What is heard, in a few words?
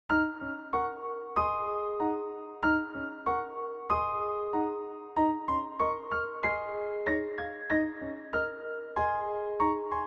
keyboard